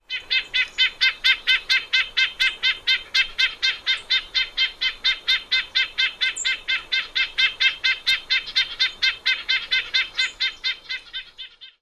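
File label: red-breasted nuthatch more calls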